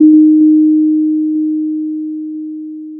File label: pop, Sound of a doorbell